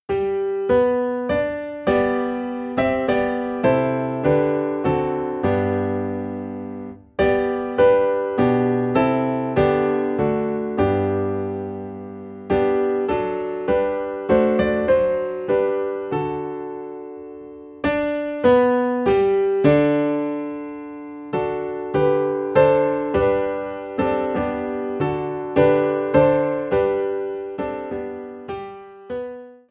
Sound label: Piano in G